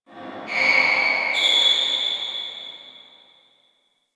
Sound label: Distant Shoe Squeak D; Warframe; Ambience; NineteenNinetyNine